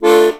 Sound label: DIATON, AKKORDEON; Best Service; Alpine Volksmusik